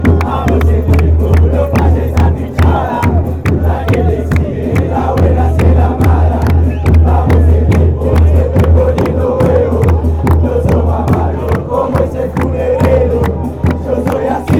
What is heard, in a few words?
deportivo merlo chant, fan chants